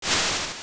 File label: Cue Ball Swim; Zelda